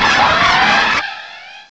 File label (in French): Cri